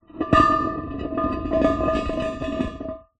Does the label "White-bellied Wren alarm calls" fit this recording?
No